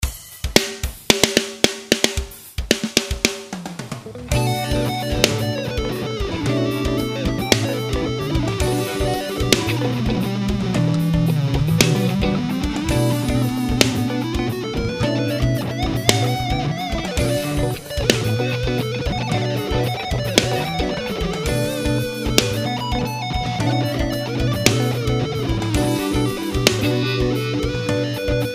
guitar fusion

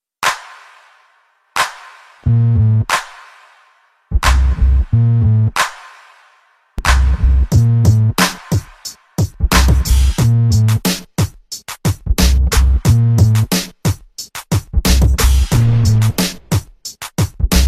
retro beats

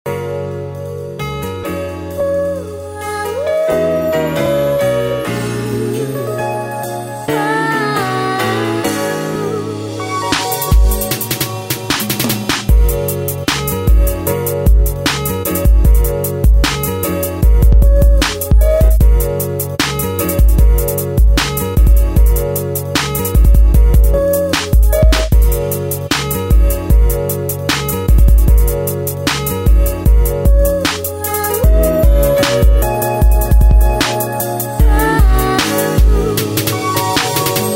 beat

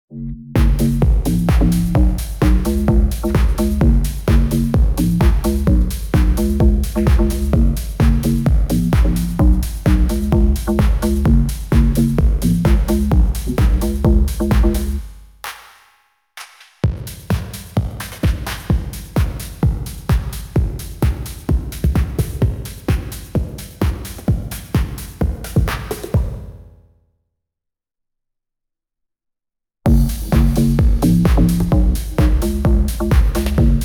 instrumental

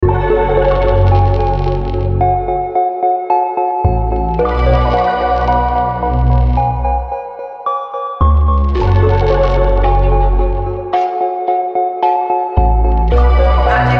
rap trap

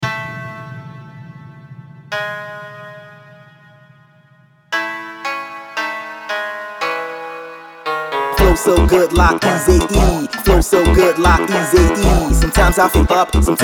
brazilian funk

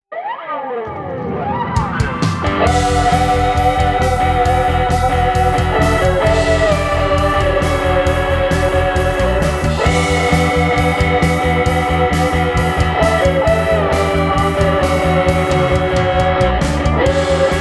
instrumental

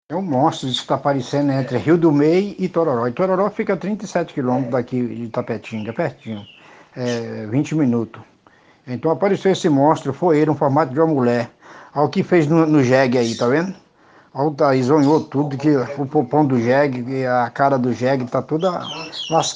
jingles; Itamarati

